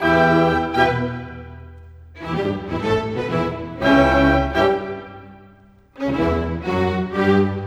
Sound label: Rock Pop, Orchestral; Sonic Foundry (Sony Creative Software); Orchestral Series VOL-4; Rock and Pop; Rock-Pop 19